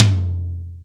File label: tom, Yamaha TG-500